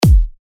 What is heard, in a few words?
Tech House Kick A#